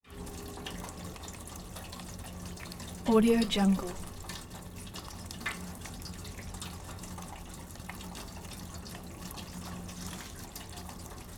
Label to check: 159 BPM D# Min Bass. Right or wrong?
Wrong